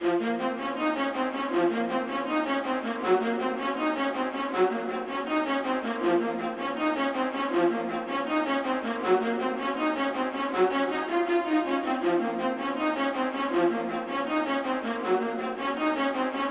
string melody 80bpm A minor

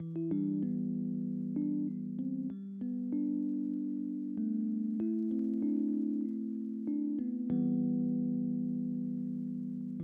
soft electric piano G major

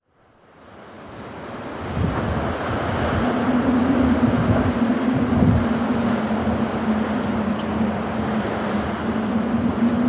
wind; bbc

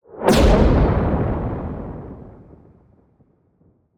Thunder Clap, Heavy Impact, Explosive; Epidemic Sound

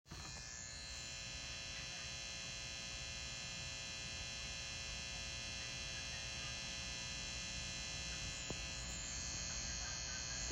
static sound

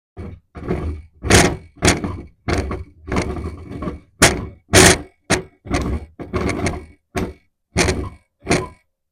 Fooseball

